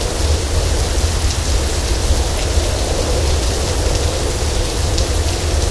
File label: rumble rain; hl2